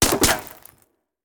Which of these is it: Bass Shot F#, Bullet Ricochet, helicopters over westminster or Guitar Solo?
Bullet Ricochet